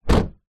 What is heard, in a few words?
car door close external toyota corolla; transport